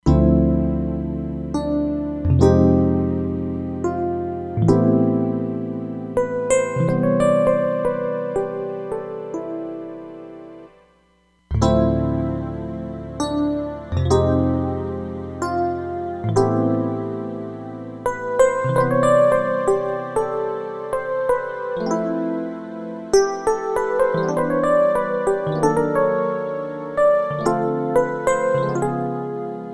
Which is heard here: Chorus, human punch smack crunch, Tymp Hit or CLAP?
Chorus